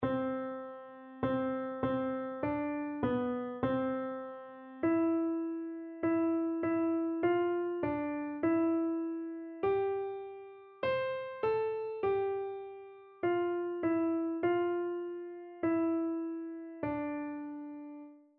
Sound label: fairest lord jesus, keyboard